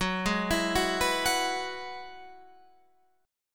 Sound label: Gb 7th Suspended 2nd Suspended 4th, Guitar, Standard; Gb7sus2sus4 arpeggiated